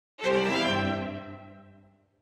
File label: Chest; Sea of Thieves; Arena; Regal Hound Chest